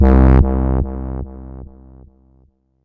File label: Philter (bass echo); Sonic Foundry (Sony Creative Software); Ugly Remnants VOL-2